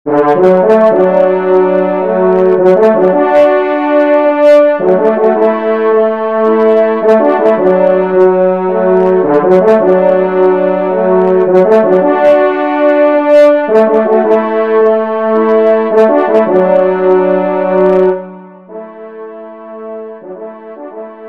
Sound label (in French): trompe; La Nicoise